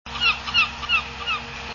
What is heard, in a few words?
gull herring, Herring Gull; Perth Amboy, Raritan Bay